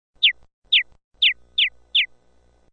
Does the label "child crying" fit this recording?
No